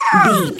goblin fairy hurt pain

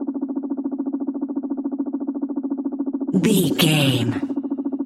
boat small cartoon propeller loop

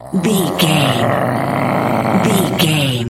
voice male growl

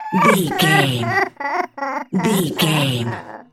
goblin fairy cry low

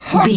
voice male attack

